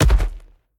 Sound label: tiamat bodyfall; smite